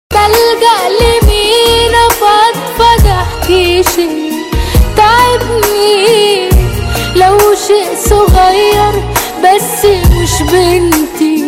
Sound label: arabic music, ringtones